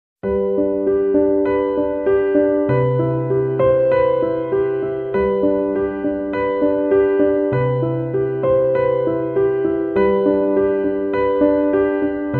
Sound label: Piano Only